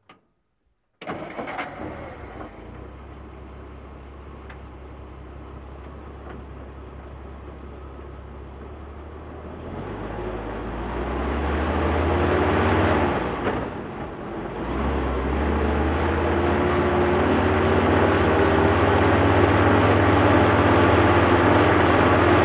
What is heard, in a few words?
Dodge Power Wagon Fire Truck, Interior Pov, Start, Idle Steady, Drive with Gear Shifts, Hard Downshifts To Stop, Off.